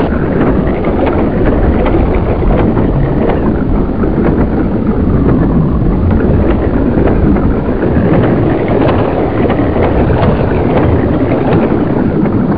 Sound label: Fly Rocks; Museum of Anything Goes